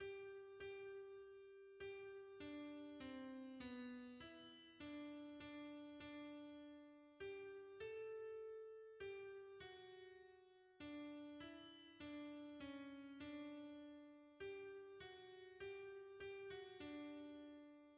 hymns, alto; Our hearts are full of Christ and long

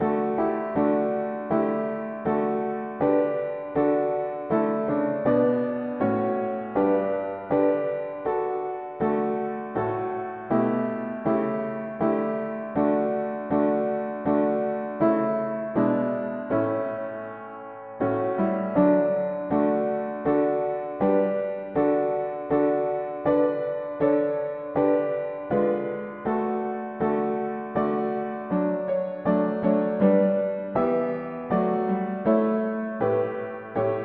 mono piano, hymns

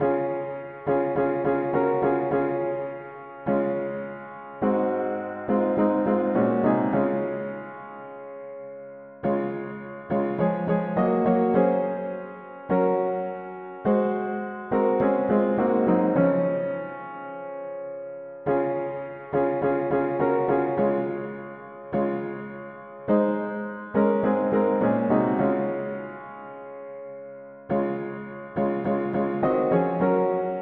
mono piano, hymns

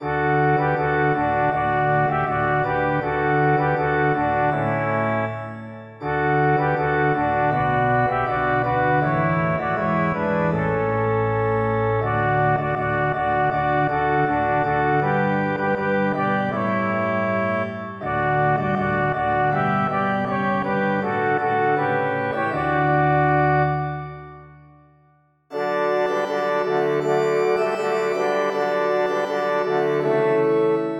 polyinstru; hymns; Saviour unto Thee assembling

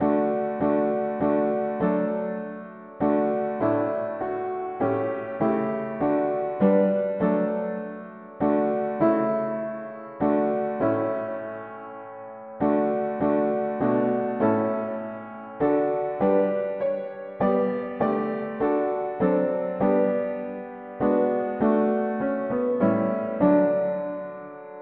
mono-piano, hymns

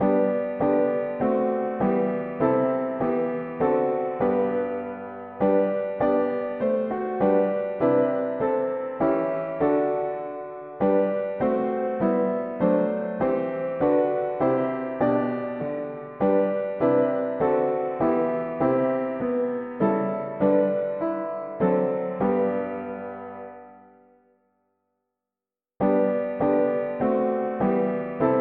mono piano, hymns; Since Christ and we are one

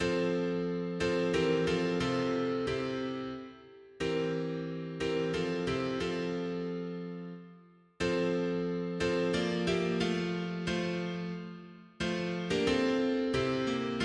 mono piano, hymns; Tout mon coeur senflamme

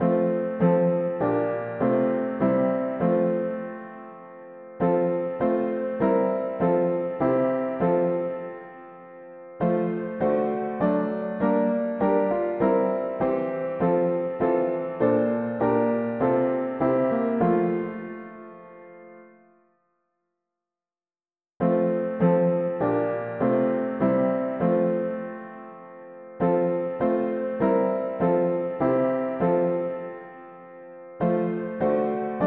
mono piano, hymns; Not to ourselves we owe

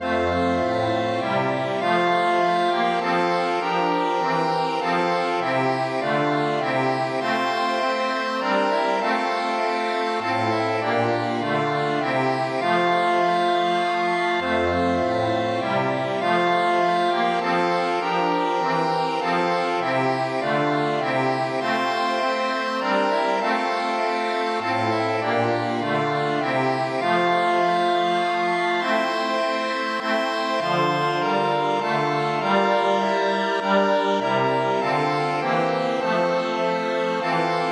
polyinstru, hymns

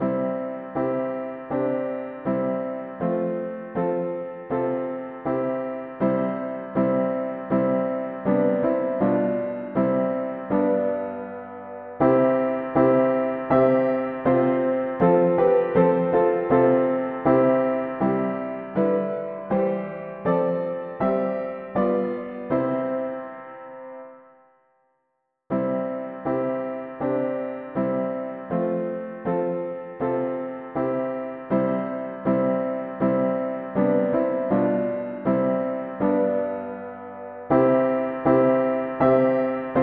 From the palace of His glory mono piano; hymns

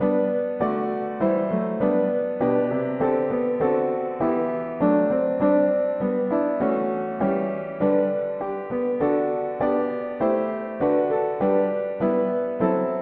mono piano, hymns; O Lord how does Thy mercy throw

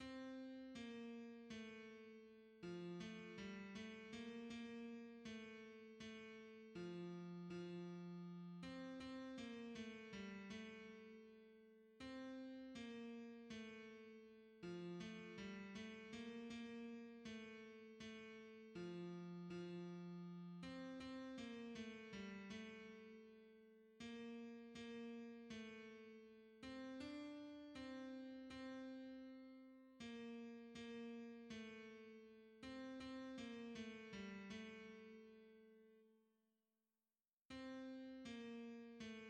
tenor; hymns; In the Lord we have Redemption

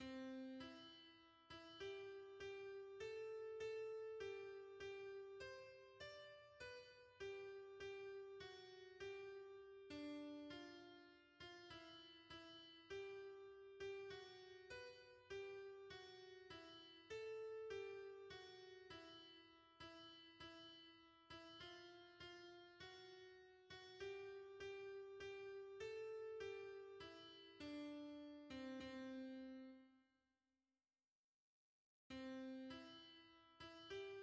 hymns, soprano

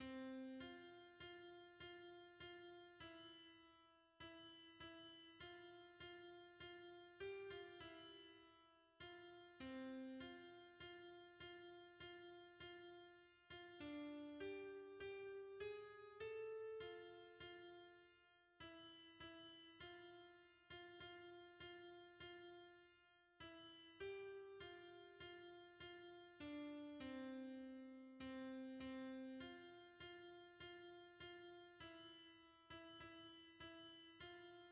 alto; hymns; Thou God of grace our Father

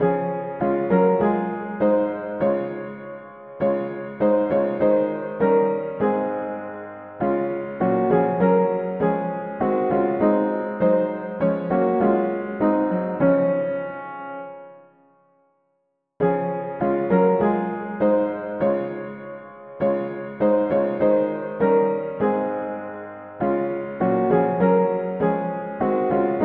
mono-piano, hymns; One spirit with the Lord

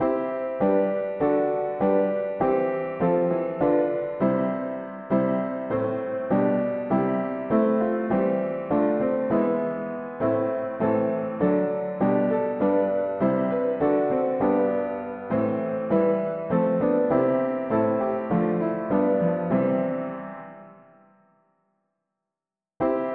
mono-piano, hymns; Awake each saint in joyful lays

